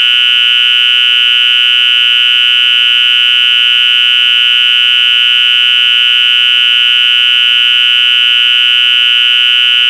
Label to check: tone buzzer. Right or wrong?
Right